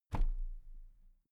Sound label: toolbox place upright soft